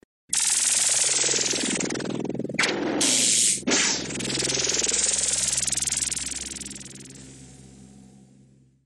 Laser; Flash Gordons

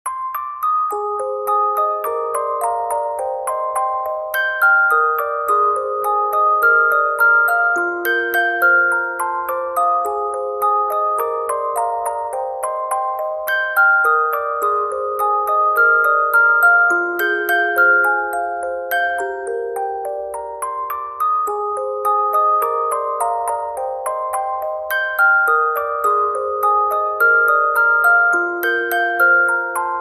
Music Box

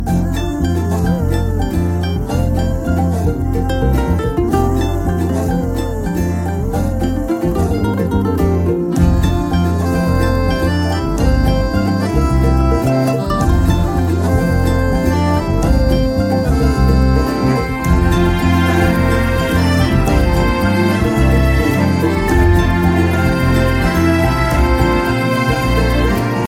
Lovely Instrumental

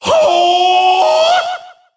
HOOOOOOOOOOOAH

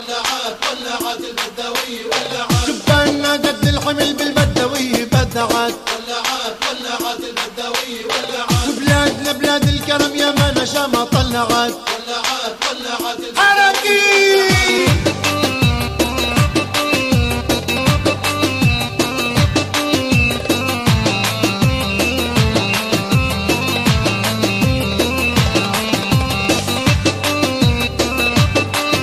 Palestine Folklore

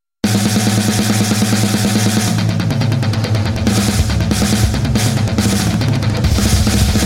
Drums

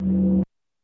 Cyber Message

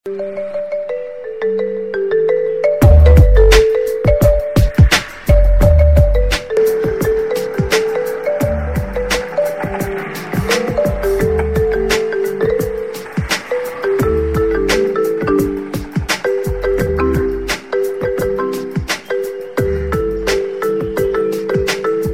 Hosana Instrumental

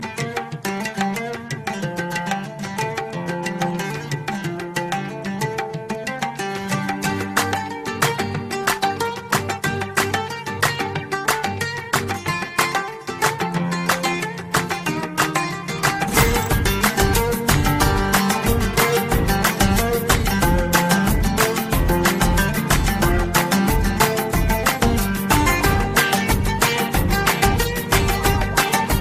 Arabic Instrum Oud

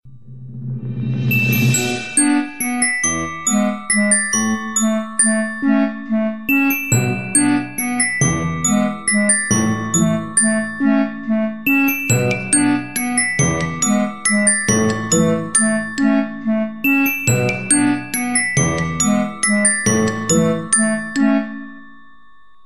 Nokia Xylophone

